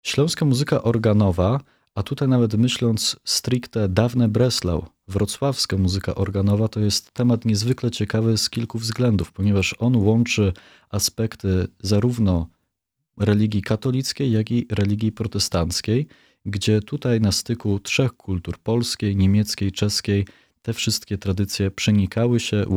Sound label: organ; Akademia Muzyczna im. Karola Lipińskiego we Wrocławiu; konferencja organowa i organologiczna im ernsta kohlera